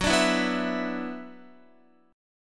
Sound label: F sharp 7th Suspended 4th Sharp 5th, Guitar